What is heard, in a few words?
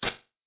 dice click; Dice World